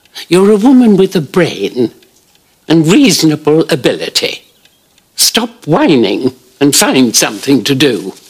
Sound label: Whining